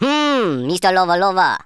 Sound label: Beautiful elegant